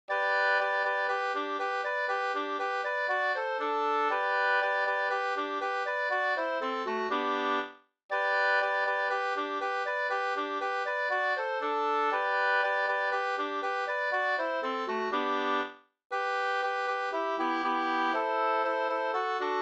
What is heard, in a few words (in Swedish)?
folkdans; Den toppede hone 2st